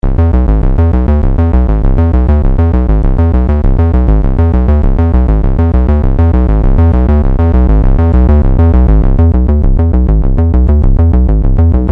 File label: JP8 Pulse Bass